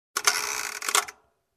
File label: Setting up an alarm clock